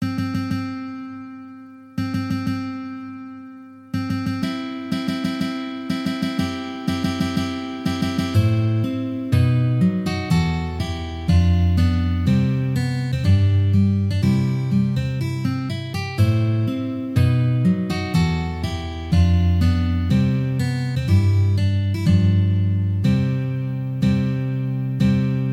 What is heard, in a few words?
Wedding March